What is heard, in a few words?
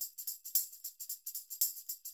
TAMB, Percussionworks